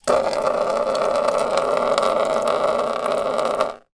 household water hose spray pour slow onto plastic object; zapsplat